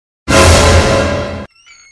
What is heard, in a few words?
jump scare suspense; Jumpscare Suspense Noise